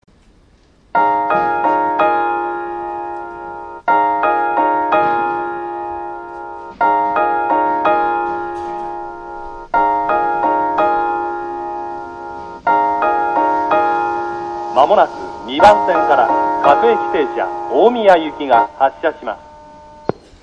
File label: shinjuku melody, Tokyo Train Tunes; japan